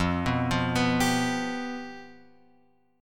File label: F Suspended 2nd Flat 5th, chord; Fsus2b5 Chord {1 2 3 4 X 3} arpeggiated